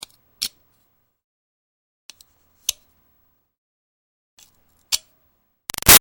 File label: Foley Rock Pick-Scrape Archaeology Small Light; Rocks; Materials